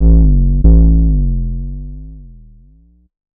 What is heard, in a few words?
ELECTRO BASS 140 A